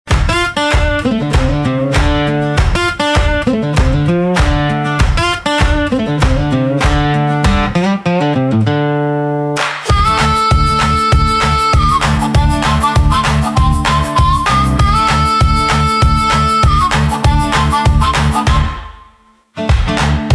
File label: blues